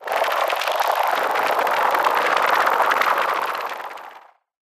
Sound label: absorb; animal